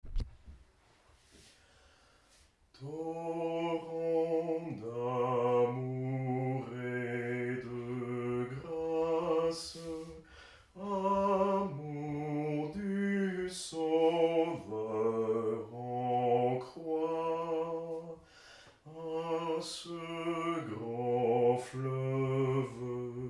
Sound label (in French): BASSE, chant a plusieurs voix; atelier de chant a plusieurs voix